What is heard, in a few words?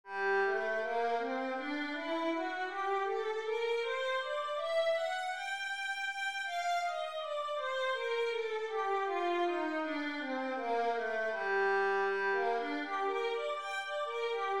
violin g minor, scales 2 octave